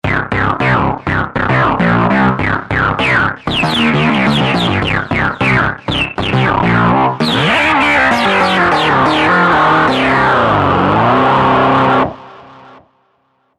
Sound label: Over Driven, V-Synth